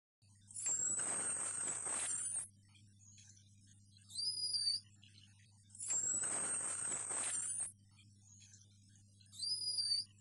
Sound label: baiji whistle